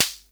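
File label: SR95 snare drum; Best Service; Dance Mega Drums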